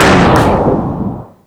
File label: explode